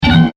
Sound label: Deep Sci-Fi Beep; Beep Sound Effects; electronic sound effect; Andrew Fleming